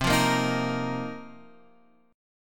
F 7th Suspended 4th C

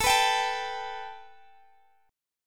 A 7th Suspended 2nd; A7sus2; strummed

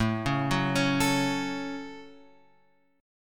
A 6th, Guitar, Open F; arpeggiated

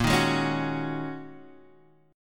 Ab 7th Suspended 2nd Suspended 4th, Bb

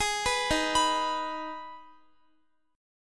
D sharp Suspended 4th Sharp 5th, Guitar; x,x,18,16,15,18; arpeggiated

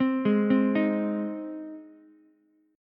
C Minor Sharp 5th C down Ukulele; arpeggiated; JGuitar

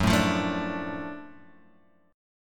Gb Minor Major 7th Sharp 5th; strummed; 2,0,3,2,3,x; JGuitar

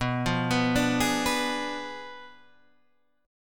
B Diminished 7th, Guitar, Half Step Down

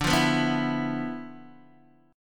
D-Minor 6th Add 9th, D, Guitar, Open D

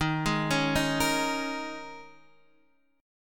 D sharp 7th Suspended 4th, Guitar, Standard; D#7sus4 arpeggiated; JGuitar

